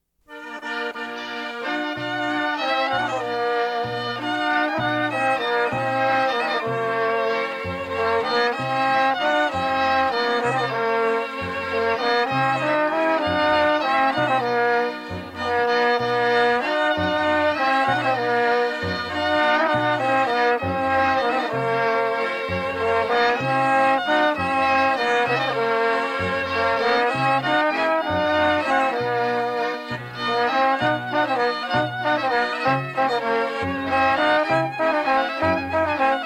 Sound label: Tonco village artesan band, Piedmont, Waltz; La Ricciola; ALAN LOMAX IN NORDITALIA